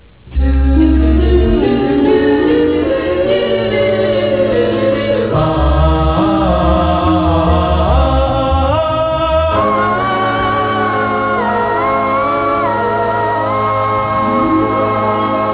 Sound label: uh uh uh